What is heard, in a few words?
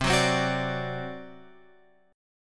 C Suspended 2nd Flat 5th C; Csus2b5; {8 9 10 11 X 10} strummed